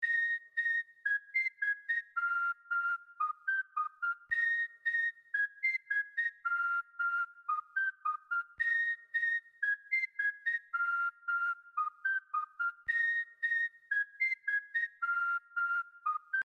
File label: Whistle